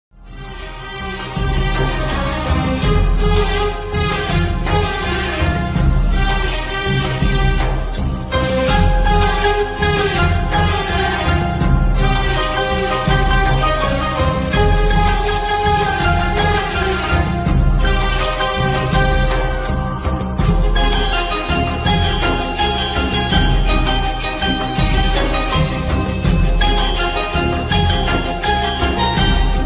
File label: bgm